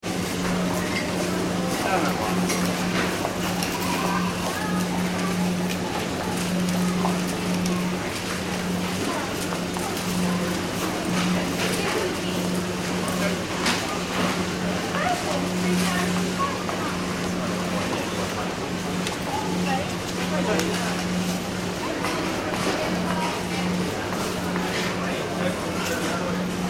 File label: ambience store supermarket; Supermarket Internal Ambience - Refrigerator Hum Close - By And People Walking And Talking; Stores and Malls; McKinney Sound